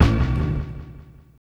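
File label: guitar, Hip Hop R n B; USB Soundscan vol.19; AKAI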